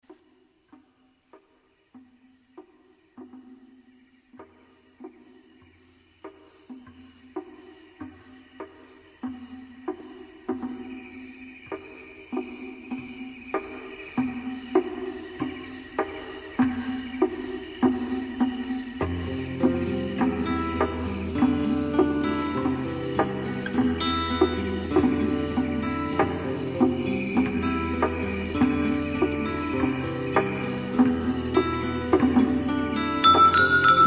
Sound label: Trance